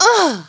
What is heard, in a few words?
HIT; battlesnds